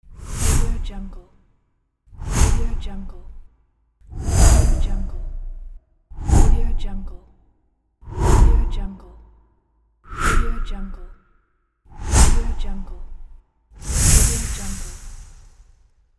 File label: whoosh